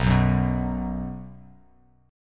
B 5th B down Bass Standard; strummed; JGuitar

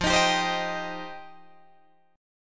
F 7th Suspended 2nd F Guitar DADGAD; F7sus2; strummed

